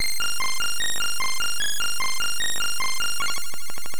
Title Beeper; Transmuter

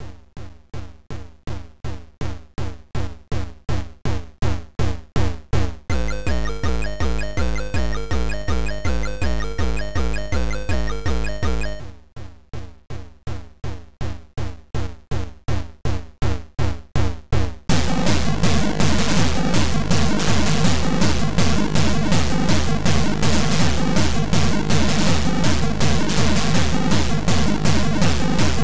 Drumulator

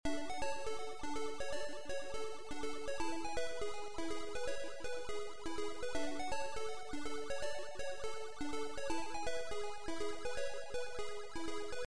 In Shop; Rustles Land 2; the land of rustles 2; arcade; classic platformers

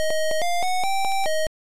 Start Game, Beeper; Cookie

